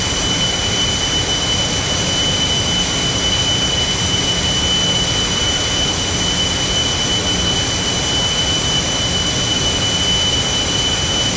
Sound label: Mig 29 Idle Engine